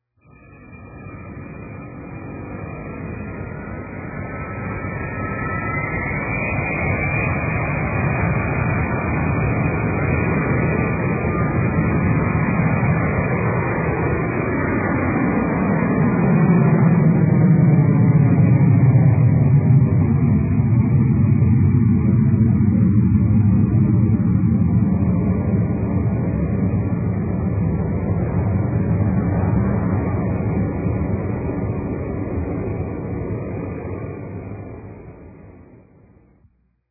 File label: 707 takeoff